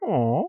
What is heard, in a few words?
draw